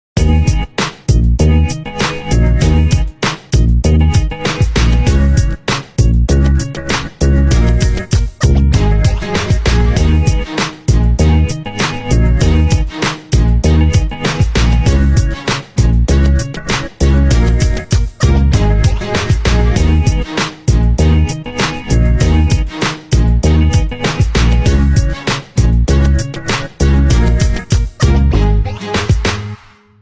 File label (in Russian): originalnye ringtony Nokia 5310 XpressMusic